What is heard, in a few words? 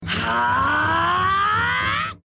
Aaaaaaahhhhhhhhhhh